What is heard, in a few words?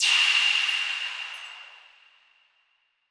round end swoosh; CrossCode